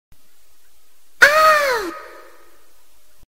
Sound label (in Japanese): 効果音 あーん